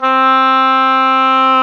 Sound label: OBOE, Orchestral Winds, Oboe mf f